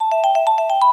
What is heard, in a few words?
Vibe Win Transfer; ShowtimePOG; ClubFusion